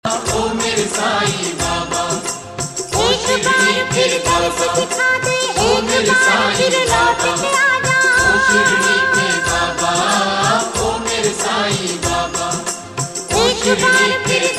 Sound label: RINGTONE